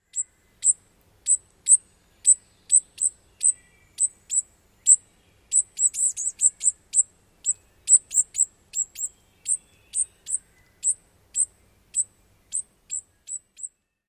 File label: interaction calls